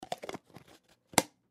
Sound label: Peanut Jar Half Empty Lid Close; Food; Household; Blastwave; Foley